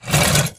Stalker Damaged Crawlscrape Concrete; Titanfall 2

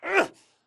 crawl diag grunt; Titanfall 2

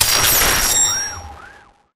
Radar Jammer Activated; Boost Card; Titanfall 2

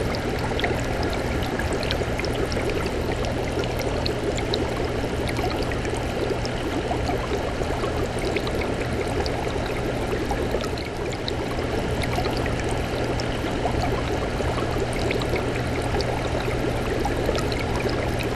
aquarium; backwater; Titanfall 2

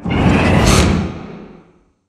sliding door sm verb close slide; beacon; Titanfall 2

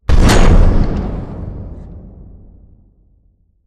Prop Warehouse Giant Door Open Stop; Titanfall 2; Boomtown

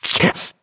grunt hits; Titanfall 2

